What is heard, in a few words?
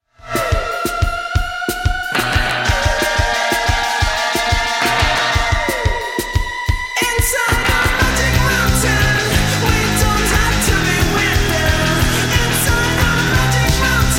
drums